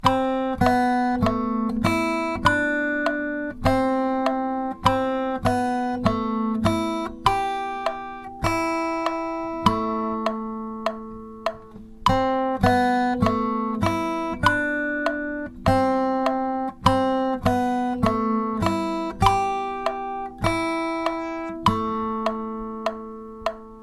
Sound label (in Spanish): melodia en compás de 4x4